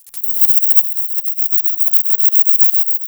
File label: mantis clicks; prayingmantis